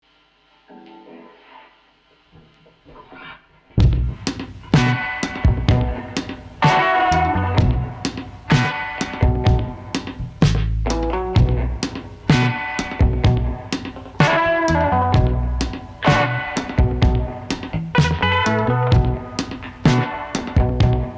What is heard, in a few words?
funk